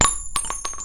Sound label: spread gun casing